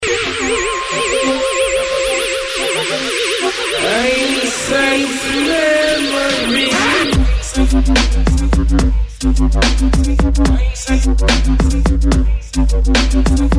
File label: Dubstep